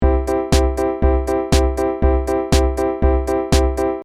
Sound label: Piano Short